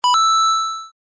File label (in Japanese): アイテム入手 レトロ